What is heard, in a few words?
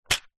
box card small safety pins pick up; household